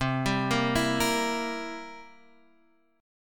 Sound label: G Minor 6th C; Gm6/C arpeggiated; JGuitar